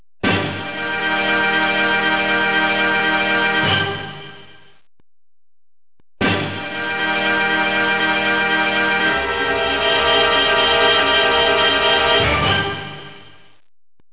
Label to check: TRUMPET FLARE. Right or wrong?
Right